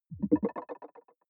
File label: button echo marble bubble; soundpool